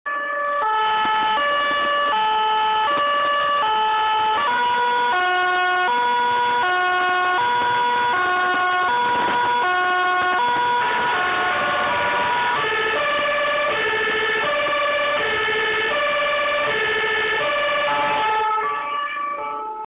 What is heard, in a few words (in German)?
Stadt Land Pressluft